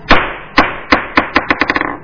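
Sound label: ball thrown it rolled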